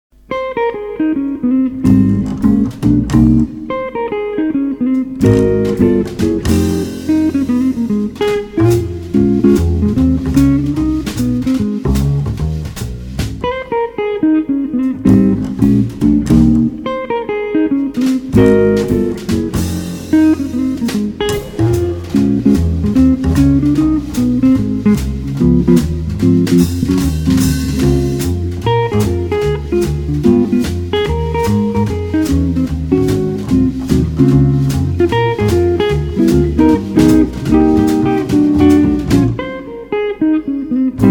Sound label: latin jazz trio